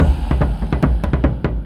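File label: Music Shield; From Dust; PS3; Xbox 360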